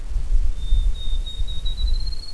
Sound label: Field Sparrow